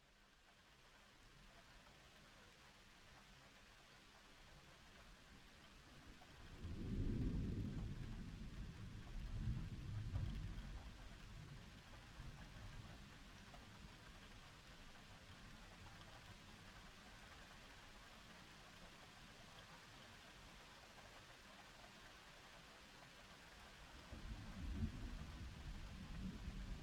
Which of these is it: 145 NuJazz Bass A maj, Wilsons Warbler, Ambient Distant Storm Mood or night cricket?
Ambient Distant Storm Mood